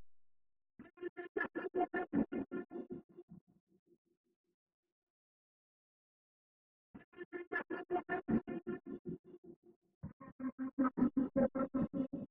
156 BPM F Min Lead; Cymatics; Intergalactic; 2022 Melody Collection Platinum Expansion

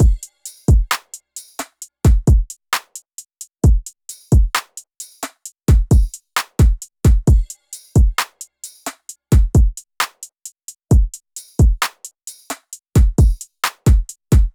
Drum Loop - 132 BPM; Brunch; Cymatics; DOPE DRUMS VOL. 1

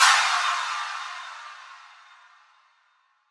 Impact Clap; Riddim; One-Shots; SFX; Ghosthack; Riddim Essentials Volume 2

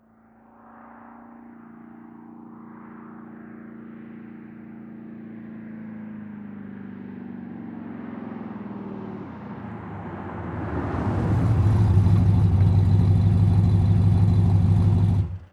Muscle Car In Idle Off; Vehicles; Cars & Trucks

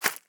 Arrow Impact Chainmail; Game Weapons; Military; Game Essentials

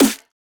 Snare, Dubstep Snares

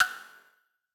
Vintage Percussion Light; Cymatics; Mystery Pack Vol 4

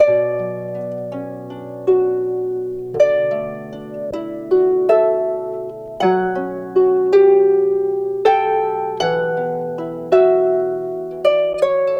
Harp Loop Dry 80 BPM B Min; Melodics; Cymatics - Eternity Sample Pack

